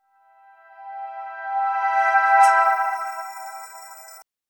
clear sound effect, light

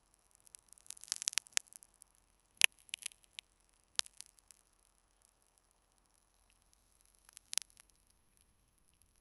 realistic asmr, fruit

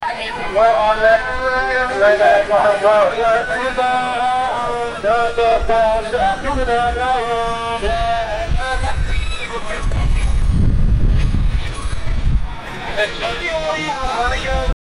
crowded wild western stre

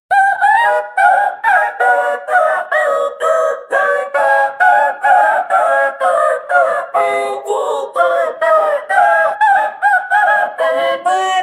a choir of 3 or 4, singing

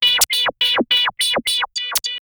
bip futuristic lazer; beep

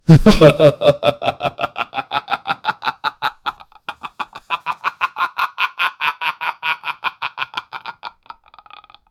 old man evil mad laughter

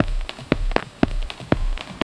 basic footsteps loopable

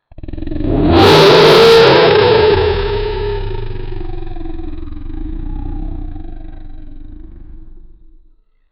roar, kaiju; godzilla

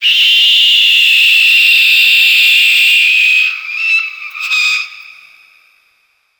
screaming vulture; animal call

